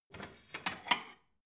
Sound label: plate cupboard take slide soft